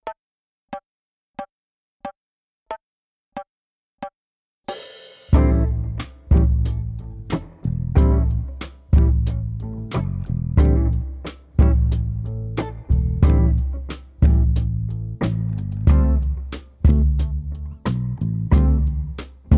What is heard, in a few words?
Blues Groove 91